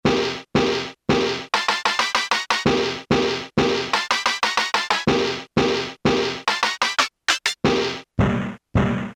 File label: BAND HIT BRASS HIT, Casio Rapman; Tones